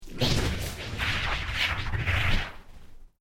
Created Creature Medium Vocalise, Alien Sound Effects; Created creatures, vocalisations and pretend speech